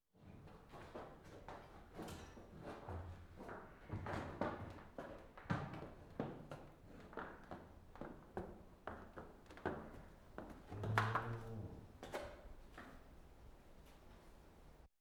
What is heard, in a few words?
One Cow Walks Into Milking Parlou; Livestock; BBC Sound Effects Library